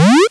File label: jump